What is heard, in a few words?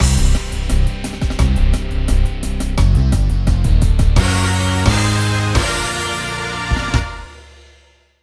Course Intro Fanfare; crash bandicoot series sound box